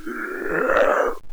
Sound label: pyro die